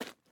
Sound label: ice step